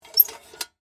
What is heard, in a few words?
Lantern Squeak, Metal; Foley; Squeaks (Sound Effects:Objects)